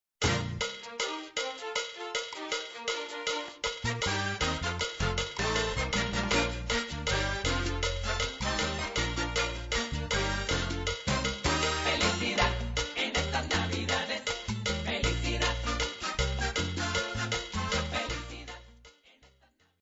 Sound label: audio salsa